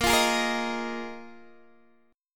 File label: A sharp 7th Suspended 4th, Guitar, DADGAD